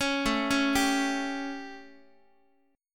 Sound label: A 7th Guitar, chord; arpeggiated; JGuitar